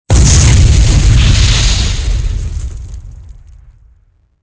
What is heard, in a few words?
explosion fiery; weapons; Soldier Of Fortune II Double Helix; XBOX; explosions